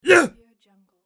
karate voice

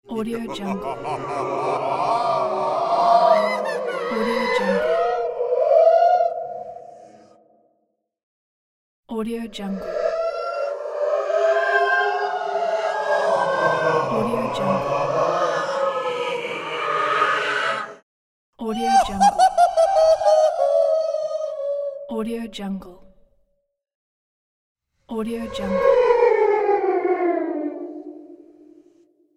ghost laugh

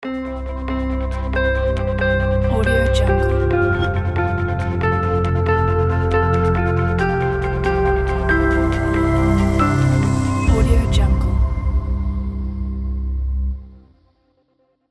logo intro

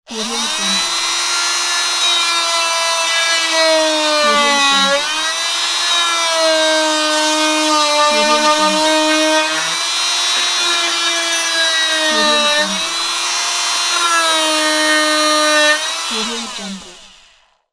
oscillator tool; monochromeeffect

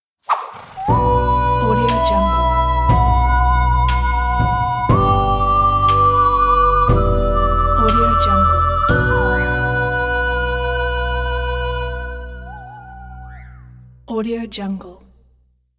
western blues harmonica logo; mikekoylo

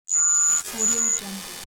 transformer sound target guidance

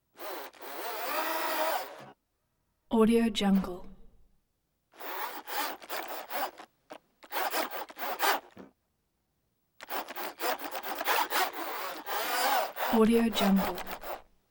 servo short bursts whir mostly fast jerky

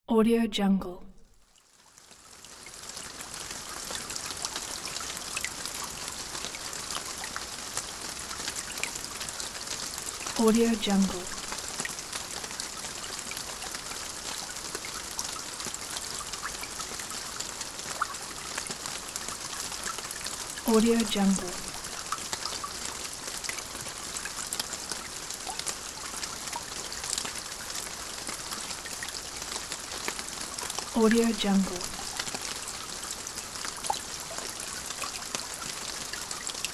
soft rain

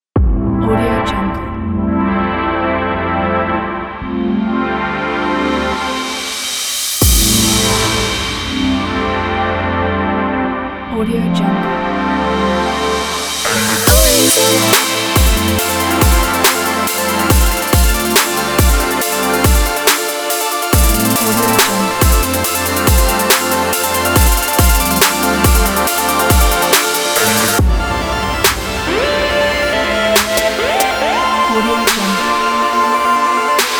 future bass

